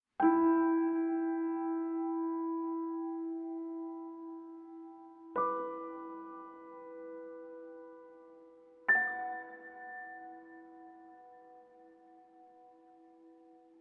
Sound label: tune